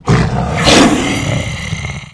Tiger Action

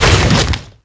Bite Arrow; Qickskil

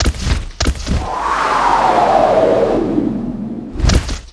Shadow Dragon Run; Seer; GamigoNA

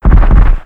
Mastodons Run; Seer; GamigoNA

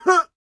bell Die

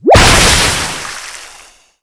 King Slime Skill; Seer; GamigoNA

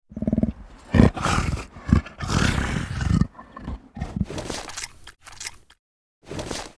Flying Lion Idle; Seer; GamigoNA

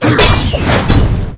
guardian

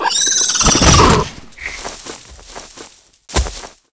die; Old Leipoon; Gamigo

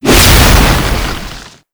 Snowy Wolf Skill

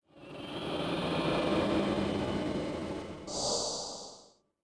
Old Fox Idle; Seer; GamigoNA

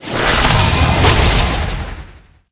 Slow Shot; Seer; GamigoNA

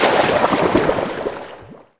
Water die; EmuClient

